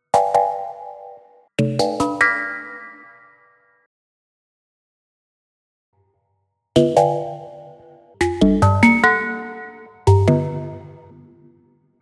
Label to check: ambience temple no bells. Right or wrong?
Wrong